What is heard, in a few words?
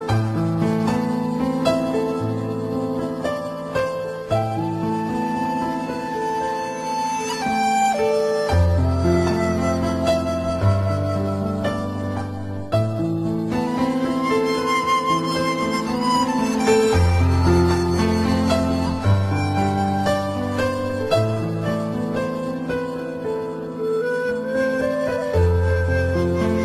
most relaxing tune